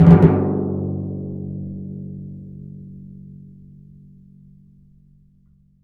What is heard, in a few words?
TMP, Synclavier Percussion